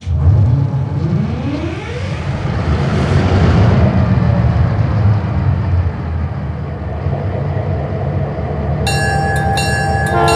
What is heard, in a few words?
EMD 645 Blower; RailPro